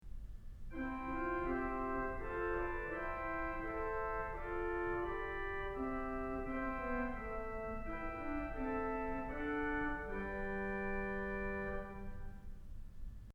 Clarion, organ stops; Kellogg Auditorium

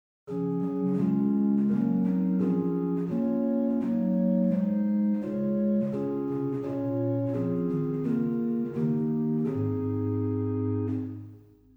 Gedacktes 8, organ stops; Reinhardtsgrimma

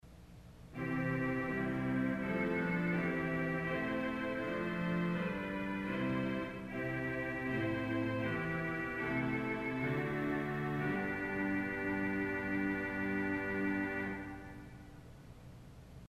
Vox Humana Trem Flute Conique, organ stops; Culver Academy

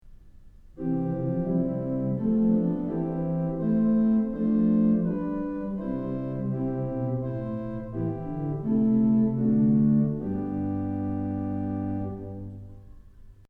Diapason; Kellogg Auditorium

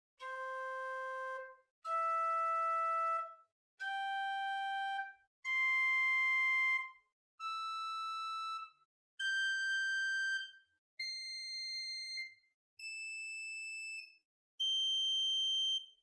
Oktave, organ; Ott Chamber Organ

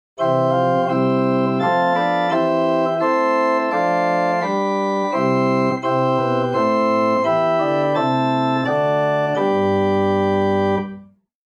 Quint 8 Spitz 4 Prinz 2, organ; Buzard Opus 3

